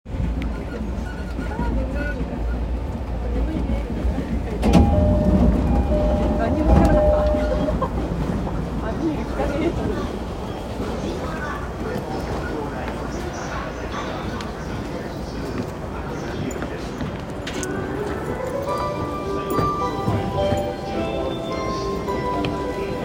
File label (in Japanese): JR東海道線藤沢南, train sounds